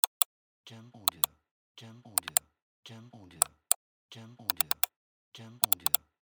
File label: Mouse Clicking